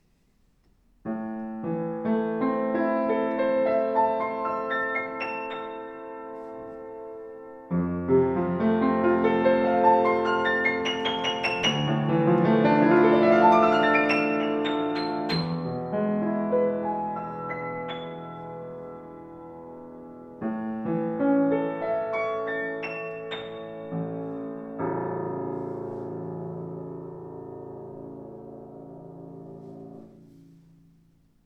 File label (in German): Grotrian, klavier; 124 Grotrian Steinweg Classic 1997 schwarz